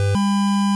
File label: success; gvr-android-sdk; ndk-treasurehunt